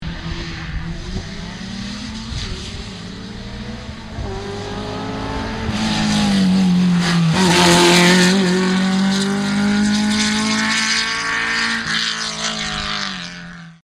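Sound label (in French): r8, rallyes; R8 Quinci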